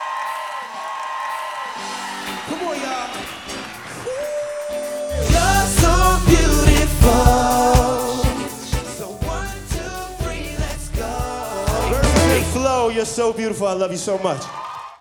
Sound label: Music; VersesAndFlow; Smollette